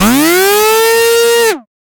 Rise Up, Wavetable, Effects; Instruments; Ableton; Synth Essentials

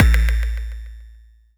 glitch kick bass; kidGlitch; Ableton Foundation FreePacks; Wicked Kits

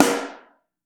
SN Mono, Snare

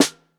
SN Bottom, Snare

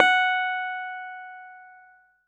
Model-C F#4; Keyboards; Ableton Foundation FreePacks

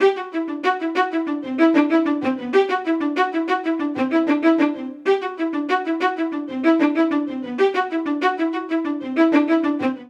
Ostinato Jolly G Major 95 bpm, String Quartet; MIDI Clips; Ableton; Spitfire Audio

